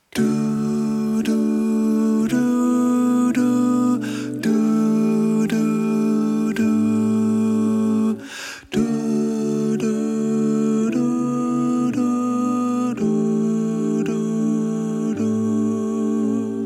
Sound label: Tenor